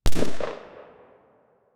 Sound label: single gunshot echoing in a quiet room